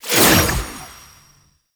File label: Rune Pick Up F; Warframe; Uriel; Vythelas